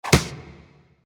defeat hit; smite; raijin

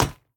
grab; smite; god gilgamesh

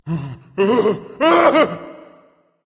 fear berserker; cuchulainn

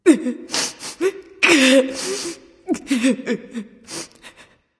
boo hoo; smite; voicepack

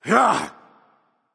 grunt attack; smite; tyr

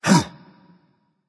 grunt jump berserker; cuchulainn

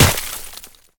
Impact Ice; smite; god merlin

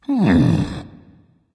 thinking dragon; fafnir

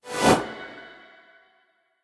basic swing; smite

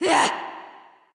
grunt hit ghost; smite; voicepack; hel

